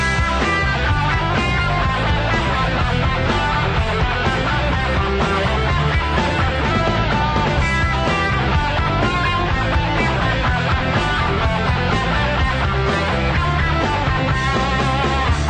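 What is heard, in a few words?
melody air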